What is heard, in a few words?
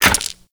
Dice Passive Slot Switch; Warframe; Koumei